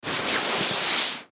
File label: Jacket Put On Ski Vest Vinyl Coat; Winter; Dressing; Human; Foley; Blastwave